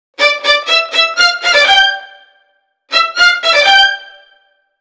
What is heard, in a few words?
STRING LICKS, Disco